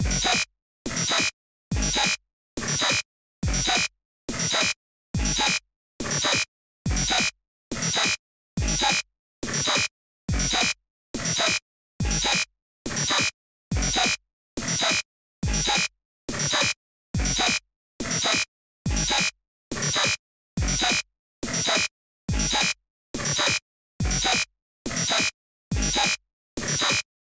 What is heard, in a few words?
WEE WOO